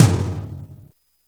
Tom; Roland R-70

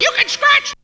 VOX; Zero G; Man Machine

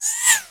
FX CYM L; E-MU Producer Series Vol. 2; More Studio Essentials; Composer; Technopourri

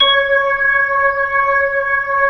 B-3, Jazz

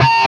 DRAG C 5, Guitar; East West; Steve Stevens Guitar Samples Collection

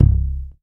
YAMAHA5 MUTE C1, Bass

